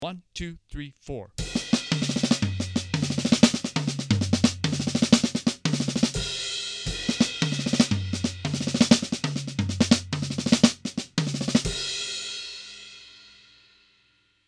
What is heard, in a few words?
Melody Kit